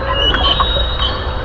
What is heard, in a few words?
beluga